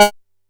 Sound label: G#4, Bass; E-MU Producer Series Vol. 6 – Analogia Project; Boom da Bass